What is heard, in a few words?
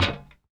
metal grate, footsteps; player; Dystopia